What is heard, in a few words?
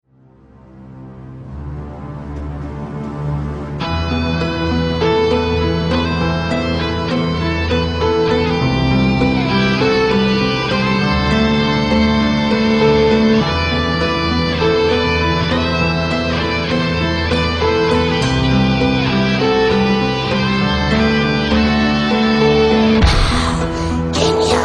death metal version